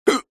human male single hiccup

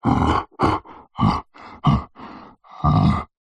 horror yeti monster grunt breathy playful; zapsplat

